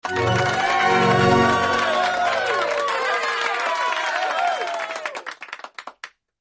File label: applause